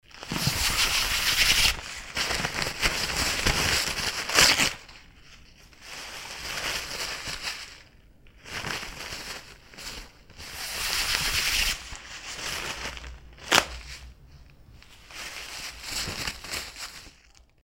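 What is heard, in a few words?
toilet paper roll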